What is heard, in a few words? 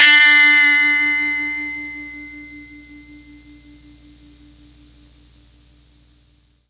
D3 GUITAR, Mellotron MKII; Mike Pinder - Mellotron Archive CD